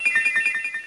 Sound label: Parabolic Charge